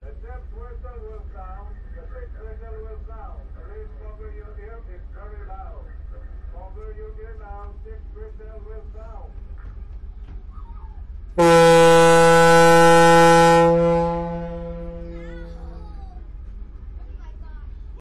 canadian ferry before departure on board announcement ship horn; your free sounds